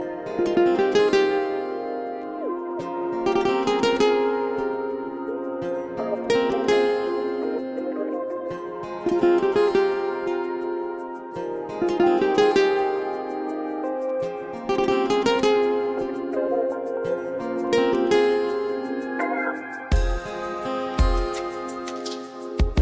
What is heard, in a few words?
beautiful music